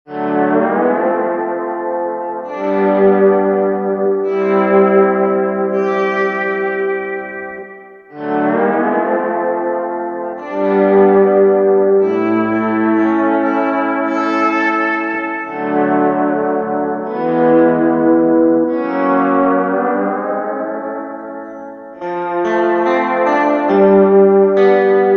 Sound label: Hawaiian Guitar